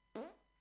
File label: fart innocent